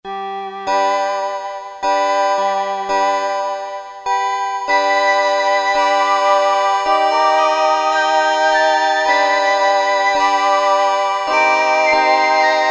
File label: SYN LYRA; Flutes & Harp